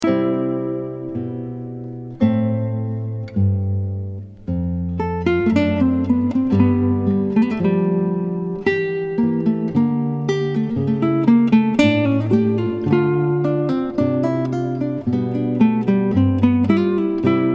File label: Bach Guitar